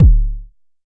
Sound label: Jomox MBase, drum machine; Jomox MBase 01